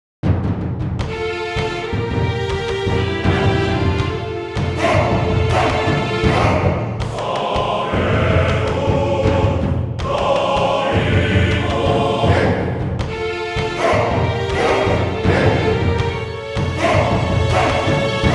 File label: Bossfight Intensity Loop; The Witcher 3 Wild Hunt; Skellige